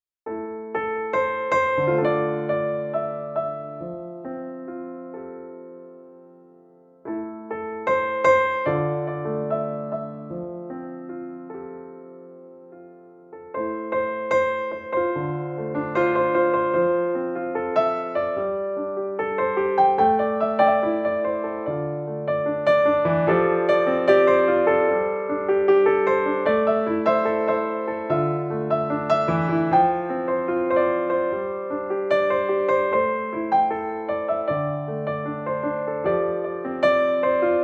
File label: Piano, Klavierspieler; ModernJukes; Hannover; Hochzeit; Empfang; Swing